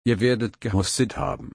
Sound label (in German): husten